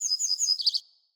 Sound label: Bird Guide Song C; Animals; Birds; Galene Bird Guide